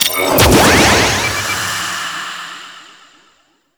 Swap Challenge Collect; Warframe; Mastery Challenges

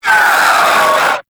Swarm Vocal, Enemies

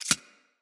Arachnoid Micro Step; Corpus

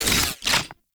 Reload, Weapons; Warframe; Tenno; Protea; Velox Prime; SMG

